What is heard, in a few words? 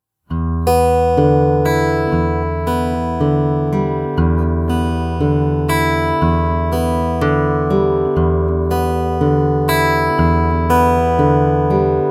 Fingerstyle, acoustic guitar; alternating bass fingerstyle pattern; Nigel Wheeler